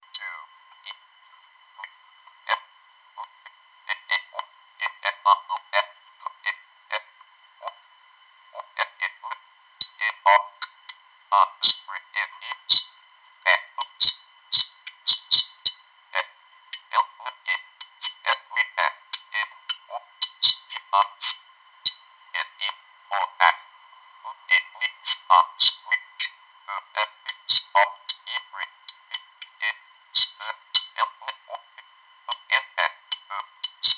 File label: 0-30hz random echo high-pass filter